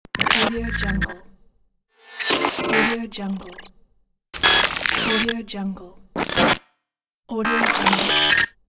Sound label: glitch